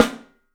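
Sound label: PICOLO SNRS, snare, drums; 3D Sound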